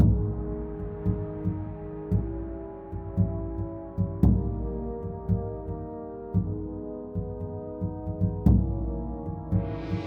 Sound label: Menu music loop